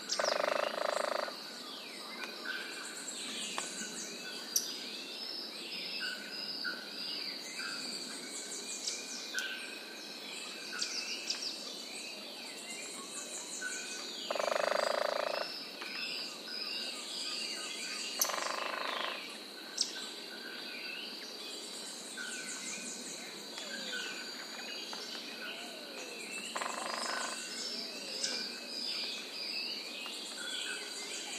Yellow eared woodpecker

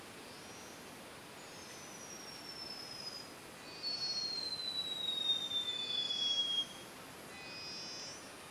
Sharpbill

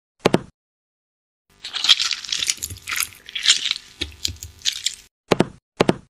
Toothpaste, خمیر دندان

